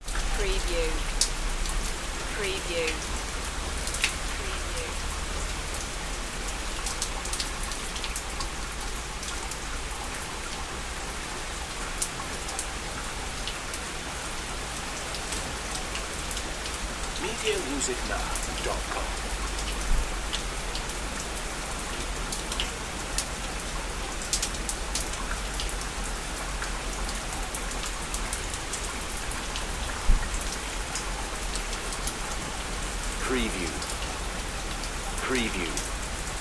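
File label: Rain Drop Porch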